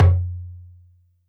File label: Djembe Open H; YAMAHA RM1X